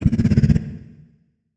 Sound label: ENDWORM damage; Barotrauma; Legacyendworm